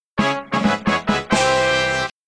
Unknown Fanfare; Indiana Jones The Pinball Adventure; Williams; arcade

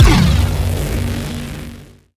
Shot Beam Medium Derail Gun

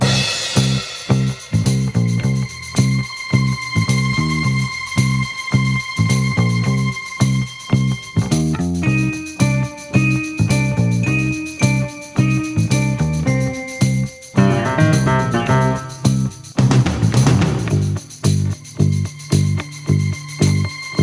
Street Fight Large Mid Boxing; bully scholarship edition

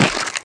Tumbleweed collision; Jessie's Wild West Rodeo

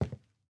footsteps WOOD

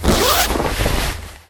Clothing

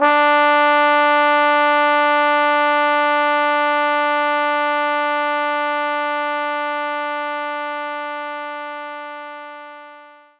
Trombone D3

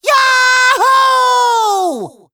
Yahoo

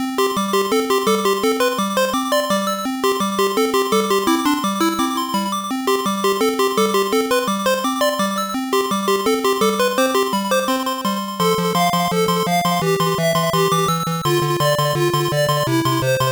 Minigame BGM; kikansha thomas ni notte pico gamerip 1995

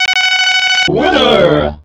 Green Beep Winner

